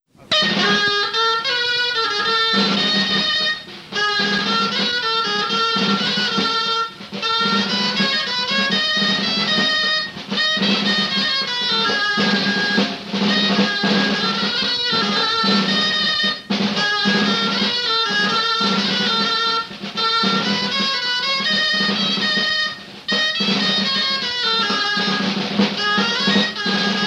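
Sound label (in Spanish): Baile asentado, Bailes tradicionales en Valladolid; La Pedraja de Portillo; Baile asentado I "El Parrillano"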